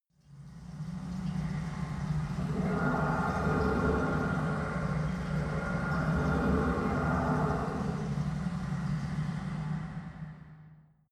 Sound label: extrinsic dark rain, energie